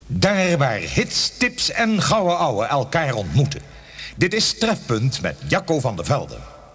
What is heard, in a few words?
silence